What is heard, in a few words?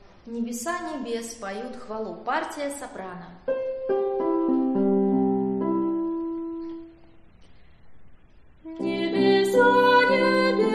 soprano; Nebesa nebes